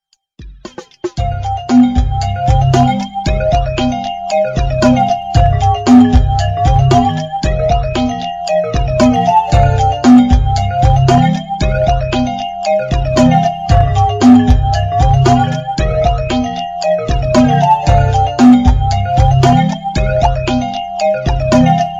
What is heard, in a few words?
Computer Remix Tune